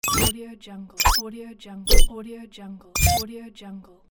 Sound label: technological transition